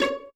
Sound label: Violins pizzicato C#4; Best Service ProSamples vol.51; Classic Orchestra 2